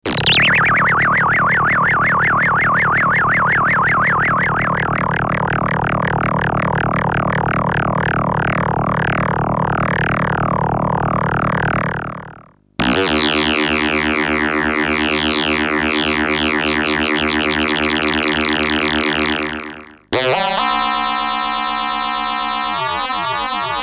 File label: Cwejman S1 Ringmod